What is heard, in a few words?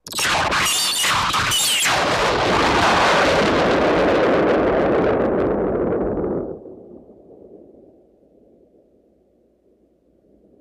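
Electric Sparks Cracking; lasers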